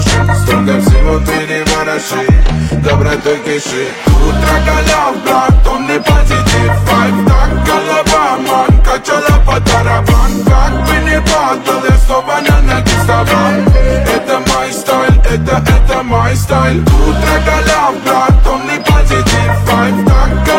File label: Reggae love